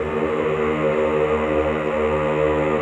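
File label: Choir ES1, Ehh; Eeh + Ehh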